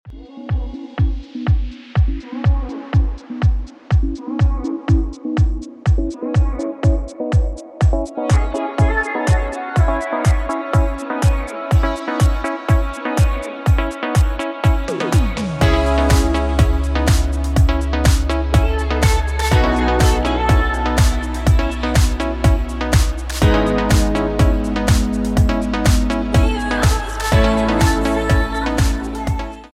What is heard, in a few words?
Ringtone